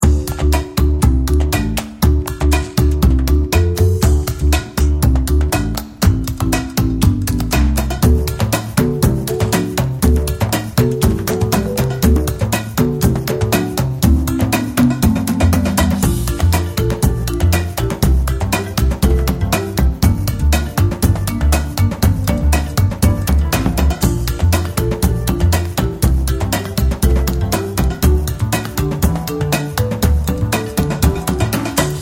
soundtrack jungle groove; Crossbow Warrior The Legend of William Tell

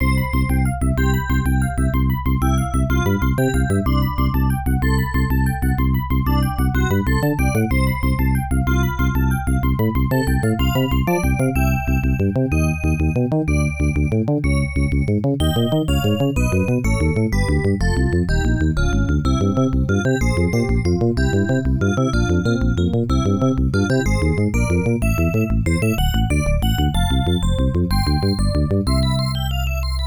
Peace Again (All Pattern Clear)

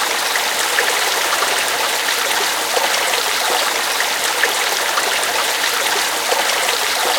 Liquid Fast

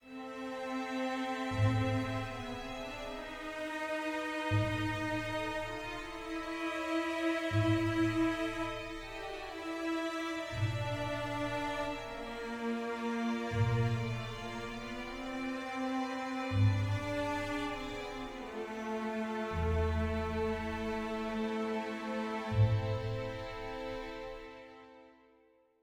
dark med

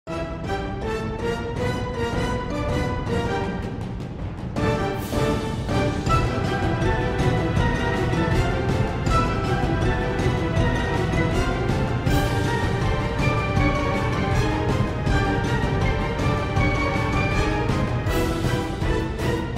Orchestral

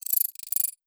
move chip; through abandoned 2 the forest

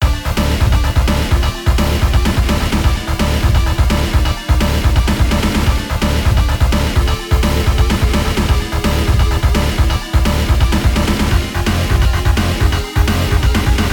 Shanty (Instrumental); Friday Night Funkin Fizzy Pop Panic

